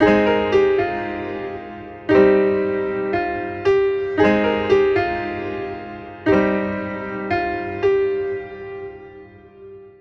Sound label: piano comp